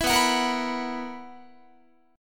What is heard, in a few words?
B Major 7th Suspended 4th B, Guitar; strummed